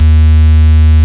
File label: FARF, FARFISA